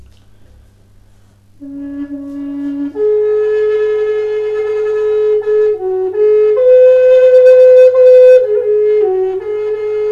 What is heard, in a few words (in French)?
Son double flute amerindienne re grave sipo